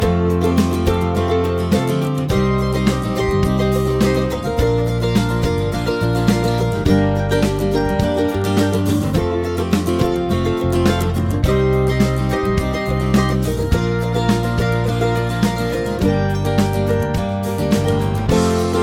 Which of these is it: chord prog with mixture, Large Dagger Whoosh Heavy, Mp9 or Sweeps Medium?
chord prog with mixture